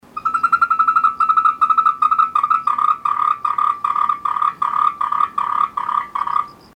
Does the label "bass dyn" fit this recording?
No